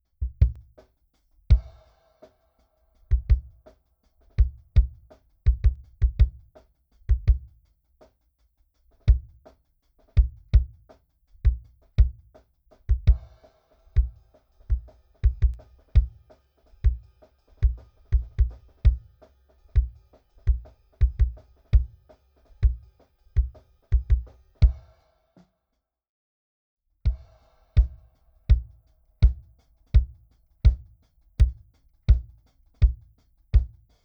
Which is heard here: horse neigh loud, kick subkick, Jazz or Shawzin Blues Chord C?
kick subkick